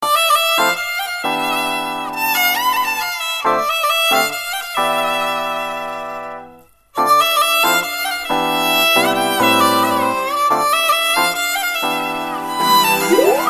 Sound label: music